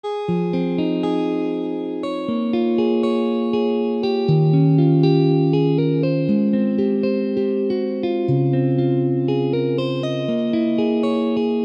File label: solo guitar arpeggio